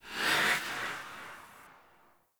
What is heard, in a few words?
Doom Sword Idle Whoosh; Excalibur